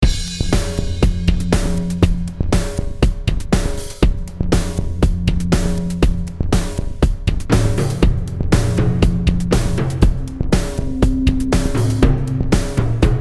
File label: DRUM AND PERCUSSION MIX